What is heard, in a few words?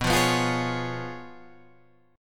Db 7th Cb, guitar; Db7/Cb strummed; JGuitar